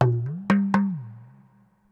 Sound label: PERCLOOP ETHNO 125; eJay